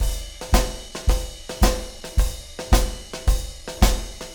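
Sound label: sweet room ride beat, 110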